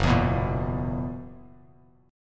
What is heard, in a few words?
Gb 11th, Bass Standard; Gb11 strummed; JGuitar